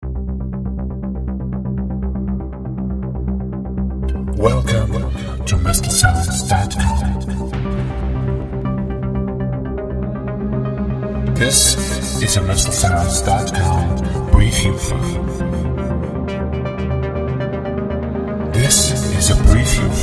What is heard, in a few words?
Darkpad Rising; moestlsounds